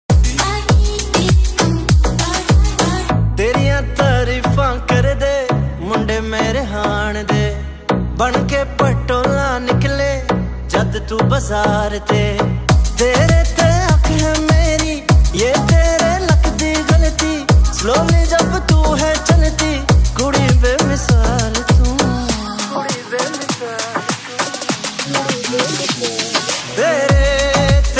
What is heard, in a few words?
punjabi ringtone